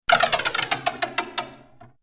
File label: lotto wheel, NÖJESFÄLT LOTTERIHJUL